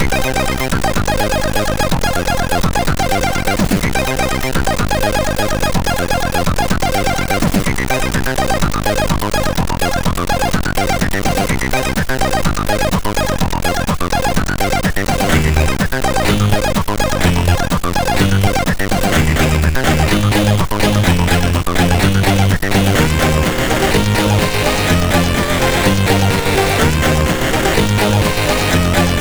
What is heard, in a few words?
Fast Moving, MOS6581R2